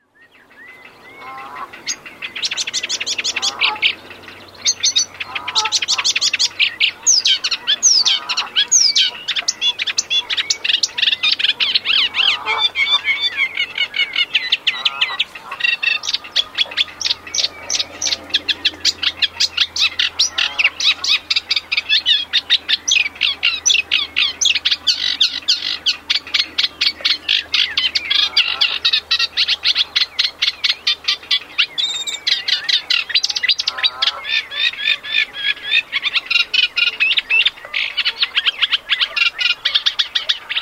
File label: reed warbler